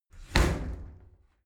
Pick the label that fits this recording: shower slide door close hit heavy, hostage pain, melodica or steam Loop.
shower slide door close hit heavy